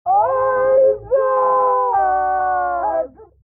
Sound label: Mom Vox Filtered Death; The Binding of Isaac